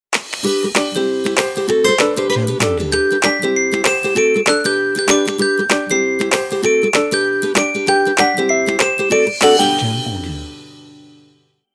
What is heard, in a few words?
Upbeat Happy Logo